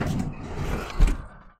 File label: Oven Door Full Open; Turkey Cooking Simulator; Kitchen